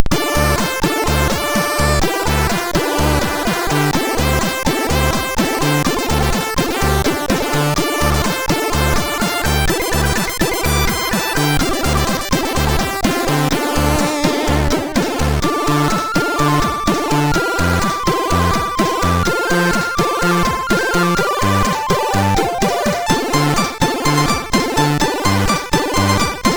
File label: Test; MOS6581R3; JCH